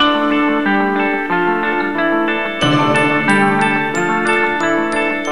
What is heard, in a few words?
guitar, Sad